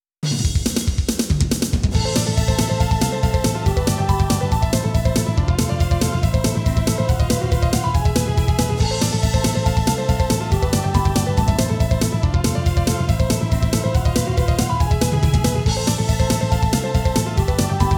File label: Sweep arpeggio passage study backing track (140bpm)